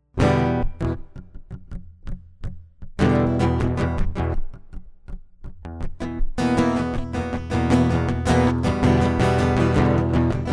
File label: Into the I of Disaster acoustic improv